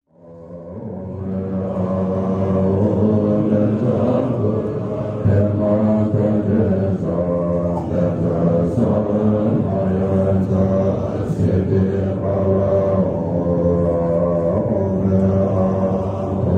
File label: Buddhist hymn; سرود بودایی